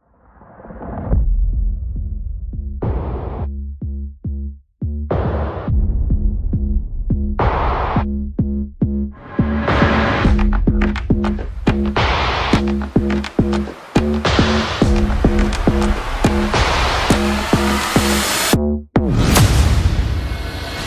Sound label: Percussion Bass and Effects